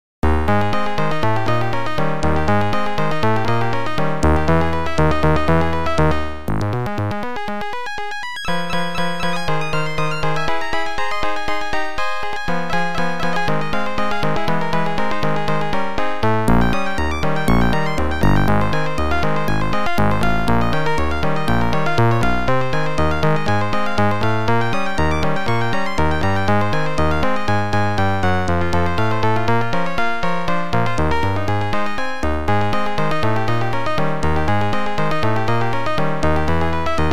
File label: Billiard Room Player Piano; LB1